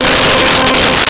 Pipe Organ C5; Nintendo; July 2020 Gigaleak

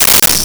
Maracas; Nintendo; July 2020 Gigaleak

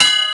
bell

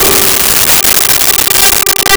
Piano G4; Nintendo; July 2020 Gigaleak

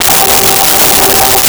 Choir Ahhs G4; Nintendo; July 2020 Gigaleak

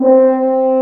French Horn c4; BGM; Nintendo; July 2020 Gigaleak

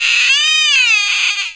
monster; Nintendo; July 2020 Gigaleak

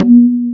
Conga Slide, Drum; Nintendo; July 2020 Gigaleak; wario4land gba ique

